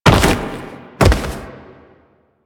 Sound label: Downed Fall B, Foley; Enemies; Warframe; Teshin; DE